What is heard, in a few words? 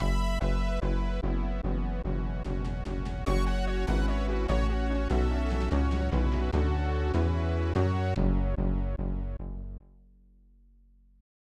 Lose Cup; J League Super Soccer Virtual Soccer; SNES